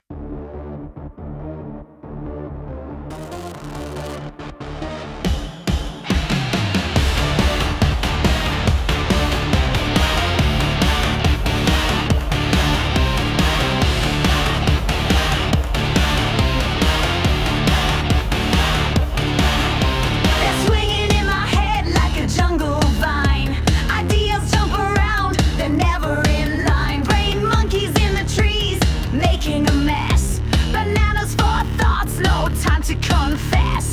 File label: funny 80s remix; They’re swinging in my head like a jungle vine Ideas jump around They’re never in line Brain monkeys in the trees